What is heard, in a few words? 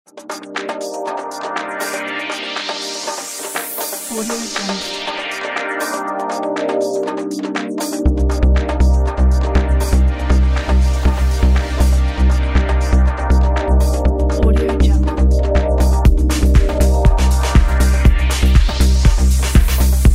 upstream